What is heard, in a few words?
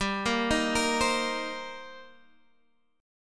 D sharp 6th, G